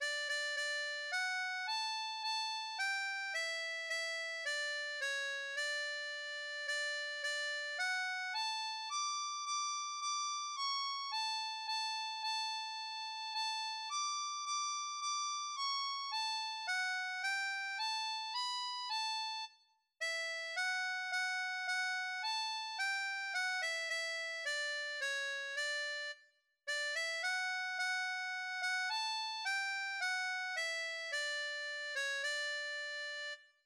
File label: Sweet Betsy from Pike, harmonica; Diatonique